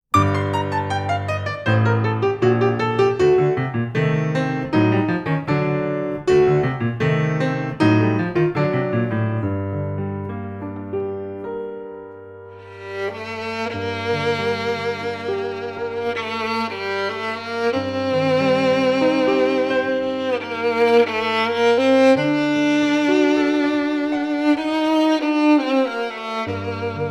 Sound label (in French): Czardas violon et piano; musique instrumentale